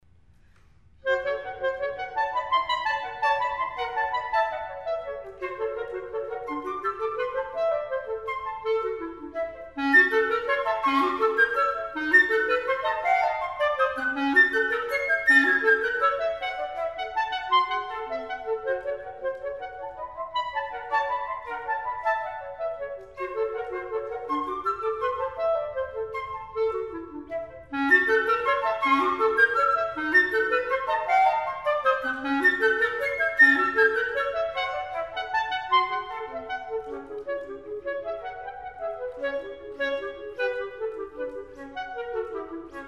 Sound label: Duos for Flute and Clarinet, Allegro